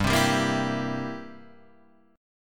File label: C 7th G